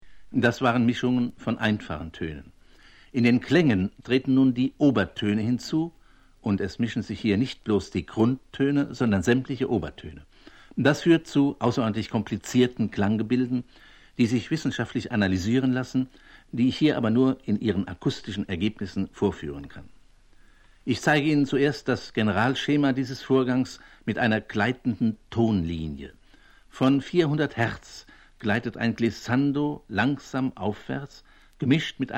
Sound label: ring modulator